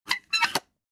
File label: Hamster cage closing